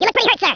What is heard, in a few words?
hurt; sc toysoldiers; fgrunt